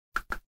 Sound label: knock wood